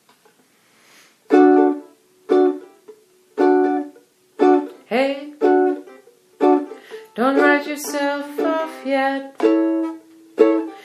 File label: vocal